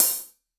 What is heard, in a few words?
Hihat Half Open